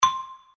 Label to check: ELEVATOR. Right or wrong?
Wrong